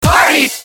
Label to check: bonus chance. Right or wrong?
Right